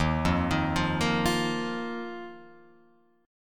D sharp Suspended 2nd D sharp, Guitar Open F; arpeggiated; chord; 3,0,3,0,1,0; JGuitar

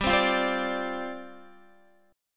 G 7th Suspended 4th G down Guitar

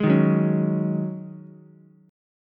Ab 7th Suspended 4th Ab Ukulele; Ab7sus4; strummed; chordshape; 1,3,2,4; JGuitar

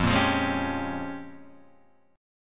Bb Minor 13th F

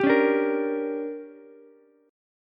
D Major 7th Suspended 4th Sharp 5th, Ukulele; DM7sus4#5; strummed; JGuitar

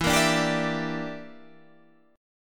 E Minor 7th Flat 5th, Guitar, Half Step Down

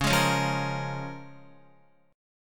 Db 6th Db, down, Guitar; Db6 strummed; JGuitar

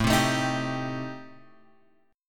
A Minor 7th Flat 5th A, Guitar, Open G

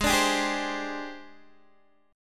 Ab Major 7th Flat 5th Ab, down, Guitar, DADGAD

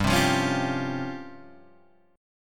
C sharp Major 7th Flat 5th, chord

